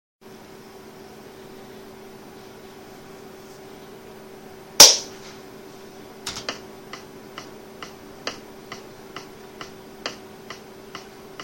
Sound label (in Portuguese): guitar voz